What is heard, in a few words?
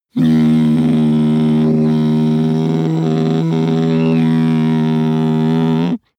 male bass hum hard; koerpergeraeusche; menschen; soundpool